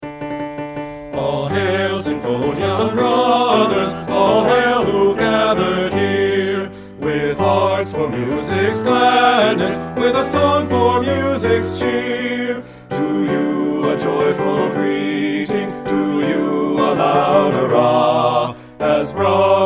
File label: Bari soft